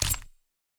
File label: fps headshot